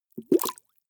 bubbles medium

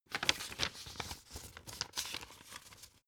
old flip page long

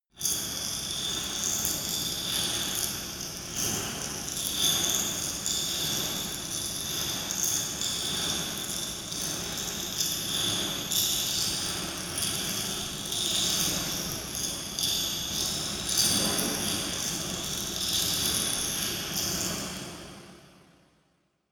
dark resonator constant rustle

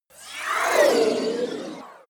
space passing small fighting racer

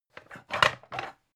pan stack

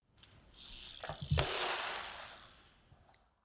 bmx maneuver ramp land

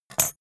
scissors drop

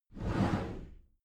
cupboard slide door slide heavy

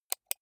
computer mouse right click

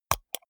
computer mouse wheel click hard

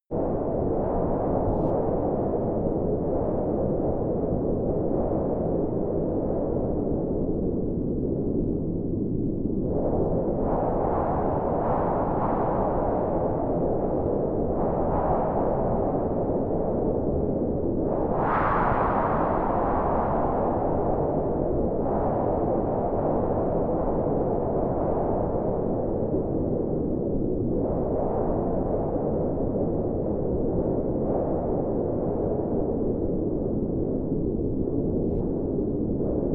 wind cold interior

